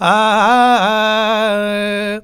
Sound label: Vox, Vocals Male, World Pop; Sonic Foundry (Sony Creative Software)